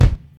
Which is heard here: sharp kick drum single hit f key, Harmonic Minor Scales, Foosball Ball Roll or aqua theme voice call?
sharp kick drum single hit f key